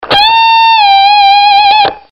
guitar

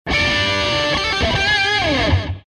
guitar

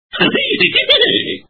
Laugh

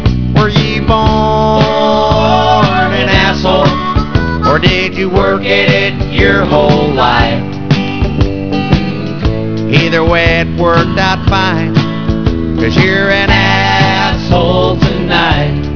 Song

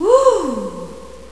woooo

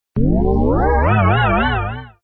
synthesizer

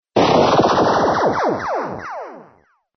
synthesizer

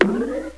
cartoon

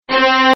synthesizer

